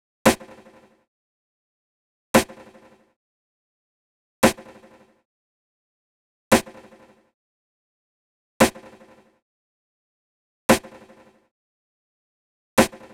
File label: Snare 115 BPM